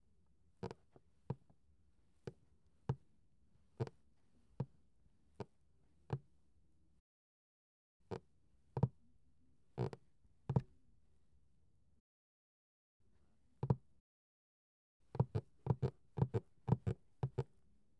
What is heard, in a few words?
Wood Floor Old Wood Floor Creaking